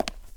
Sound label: cement step; Golden Crayon Sounds